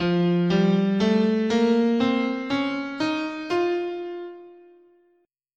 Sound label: F Fifth Mode, scale